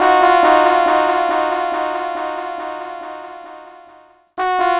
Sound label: Dub Horn; Electronic Point Blank